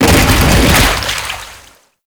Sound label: Bodyfall Water, Foley; Warframe; Duviri; Dax